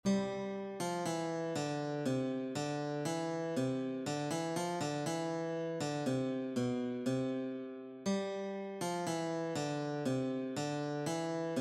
Deck the Halls Guitar Christmas Carol

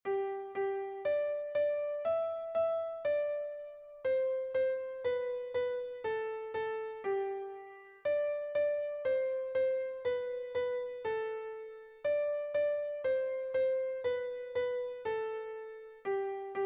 Twinkle Twinkle Little Star Piano

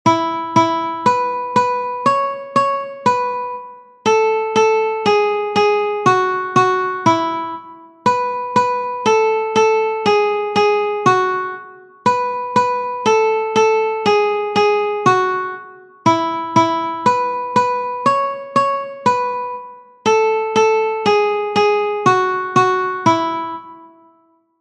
Twinkle Twinkle Little Star Guitar One String

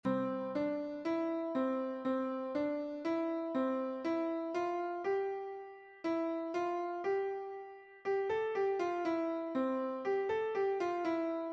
Brother John Piano

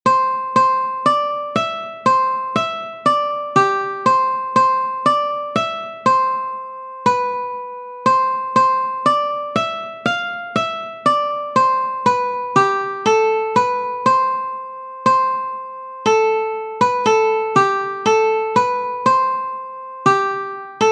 Yankee Doodle Guitar one string

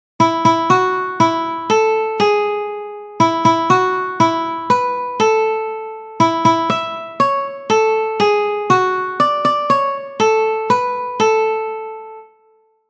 Happy Birthday Guitar One String

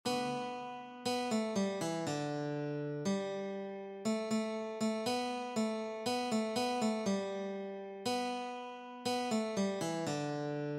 Go tell it to the mountain Guitar

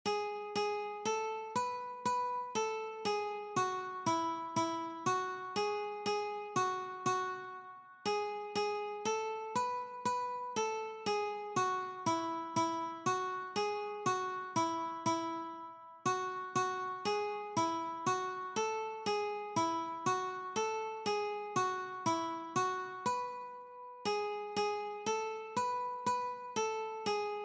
Ode to Joy One String Guitar

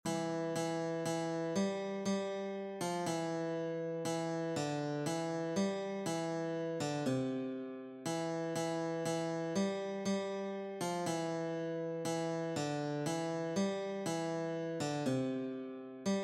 Angels We Have Heard On High Guitar

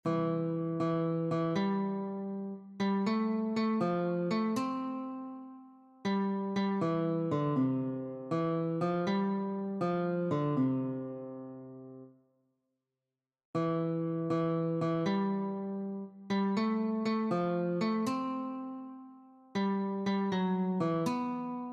O Holy Night Guitar

